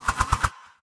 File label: Victory Swishes; Portal Quest; android; SFX Rebel Victory Swishes